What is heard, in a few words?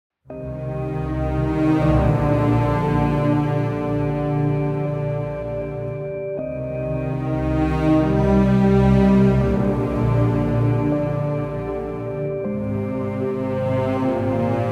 Be Patient Sad